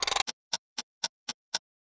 tnt activate

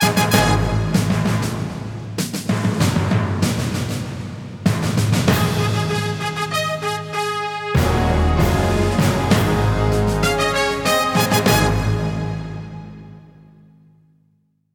Stinger; Detective Rankings

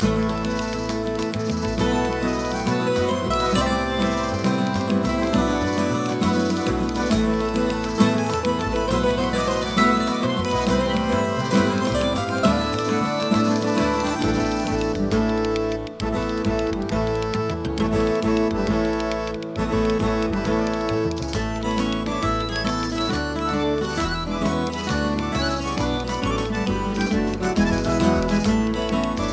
Town Tavern

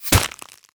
bullet impact ice

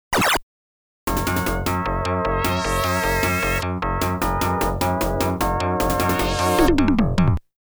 COIN START; Legend of Game Music Premium Box; Valtric

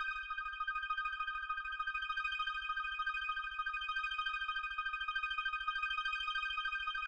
Summer Night Tinkle; Proteus

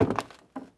Foot Squeaky Wood Bridge Walk; Lucius III

